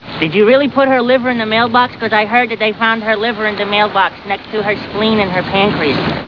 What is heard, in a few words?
Scream; Mailbox